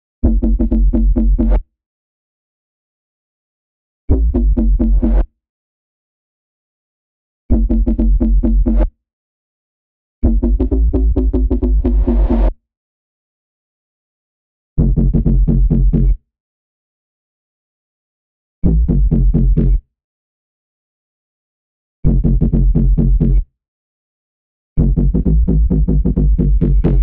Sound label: 132 BPM F# Min Bass, UK Garage; Melodies; Loop Stems; Cymatics; GHOST (Beta Pack)